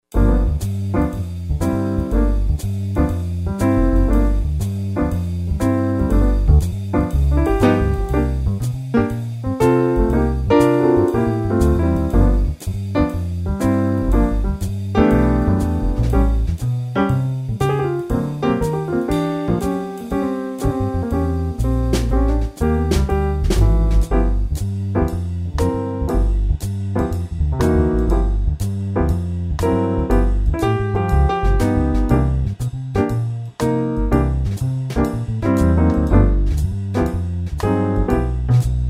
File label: jazz